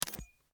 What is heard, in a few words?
click light